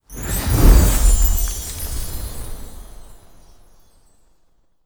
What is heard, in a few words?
large Win; netent